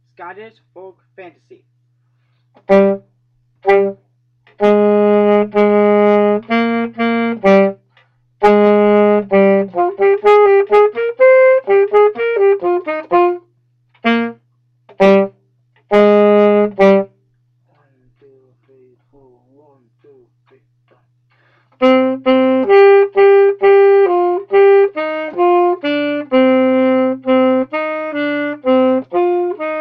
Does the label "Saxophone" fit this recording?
Yes